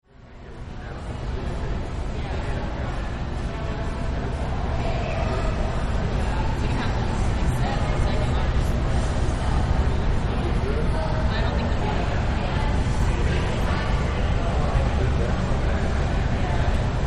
mall, Ambient Recording Of Shopping Mall, People Talking Shopping; 1000 Oaks Mall; Stores and Malls